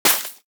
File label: Bullet Impact Debris Snow